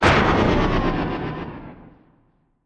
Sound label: 200 Boos; switch gamerip 2024; Paper Mario The Thousand-Year Door